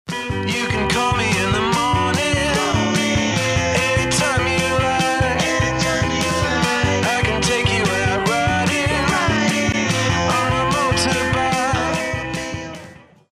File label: Groove; Nokia; 5300 XpressMusic